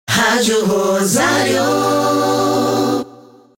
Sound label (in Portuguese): VH ACAPELLA MEDIA; shopping; ROSARIO